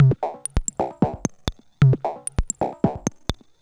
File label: Electro Perc, Dance Beats